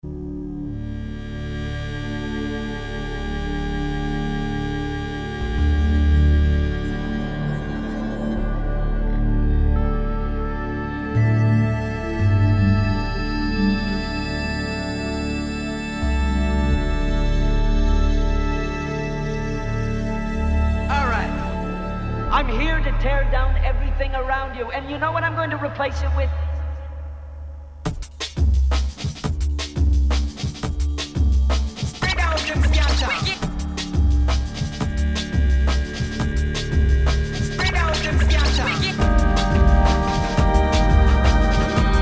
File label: Drum And Bass Thingy